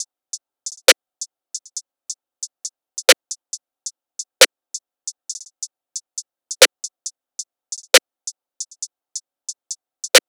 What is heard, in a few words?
Percussion loop 136bpm Top A-m